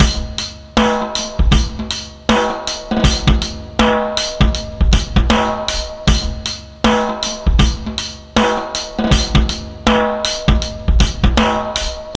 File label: Snare's Away 79 bpm, breaks; Zero-G Beneath the Planet of the Breaks